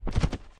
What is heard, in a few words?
Jump Hit Ground; Lethal Company; Windows